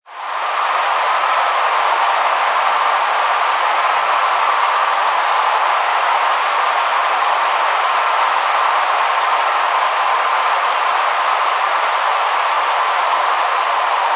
Sports Stadium Crowd Cheer Touchdown Or Goal Scored; Crowds